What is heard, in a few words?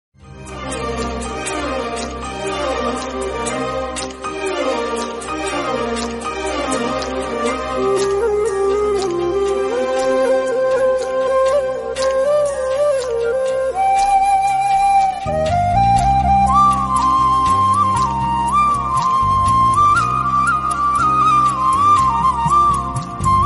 Romantic Flute, Tamil